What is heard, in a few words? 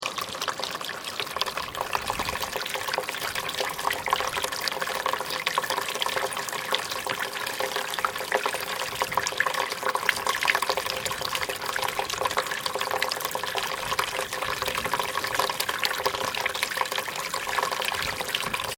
Water Outlet Trickle Loop; Domestic water movement; Nature